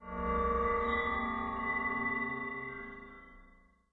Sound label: corridor, undeadtower